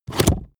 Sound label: plastic latch wobble